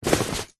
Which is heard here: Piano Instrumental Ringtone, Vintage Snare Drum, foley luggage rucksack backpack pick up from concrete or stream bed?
foley luggage rucksack backpack pick up from concrete